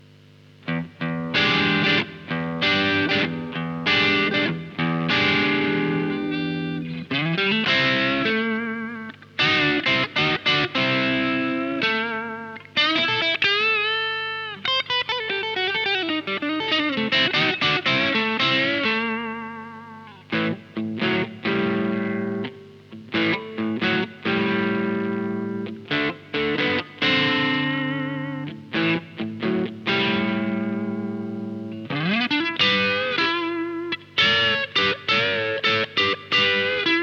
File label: Crunch Preamp; preamplis en rack